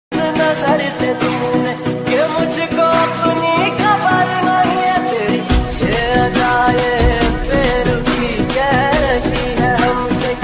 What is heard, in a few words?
Remix Song Ringtone